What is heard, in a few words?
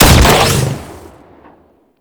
Bio Weapon Fire 2D; Warframe; Weapons